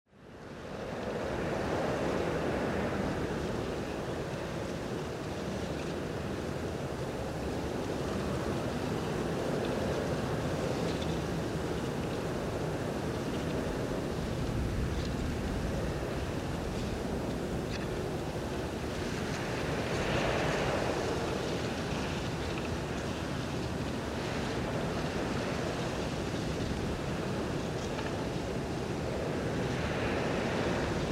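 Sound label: strong with creaking; Звук ветра в лесу, звук ветра в листве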